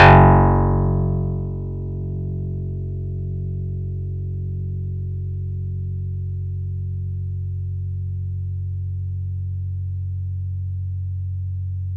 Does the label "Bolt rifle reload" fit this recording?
No